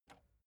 metal button spring release

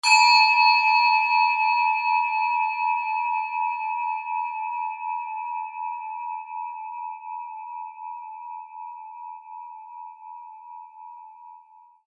japan rin bowl crash

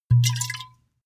glass bottle open swash

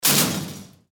elevator old style

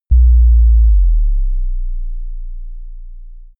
bass drop short

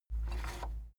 cassette eject push

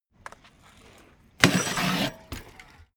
bmx maneuver grind

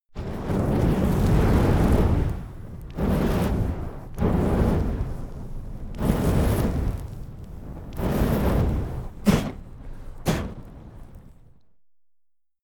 flamethrower short multi

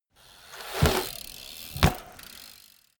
bmx maneuver ramp jump land

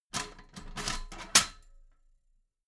metal ladder small handle unfold heavy

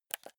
console controller joystick press fast

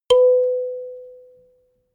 notification kalimba octave high

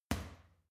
boxing punching bag uppercut soft

